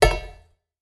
empire game profession production upgrade ui build hammer